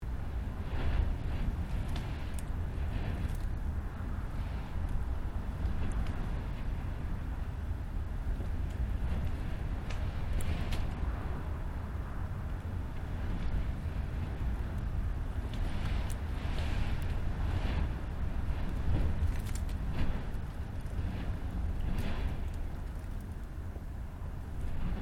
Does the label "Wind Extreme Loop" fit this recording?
Yes